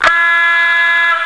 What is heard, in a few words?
Horn